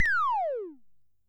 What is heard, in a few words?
tom, Coron Drum Synce DS-7